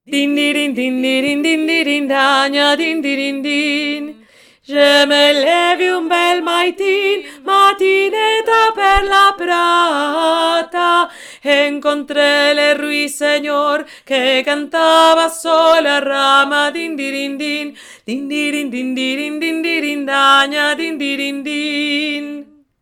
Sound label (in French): DINDIRINDIN VOIX MILIEU